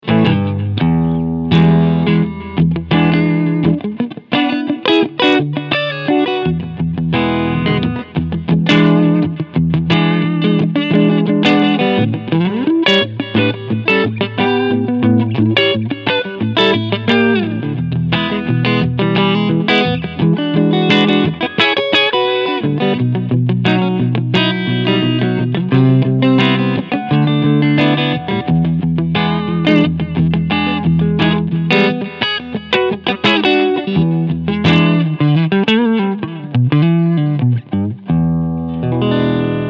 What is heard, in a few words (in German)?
PRS SE Silver Sky Cleansound Front PU Blues Style